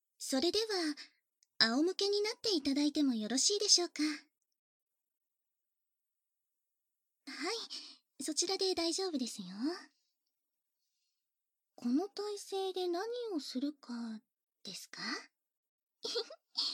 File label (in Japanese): 耳洗浄（バイノーラルマイク収録 効果音あり）; バイノーラルマイク収録のみファイル